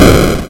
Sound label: boom ground; moondef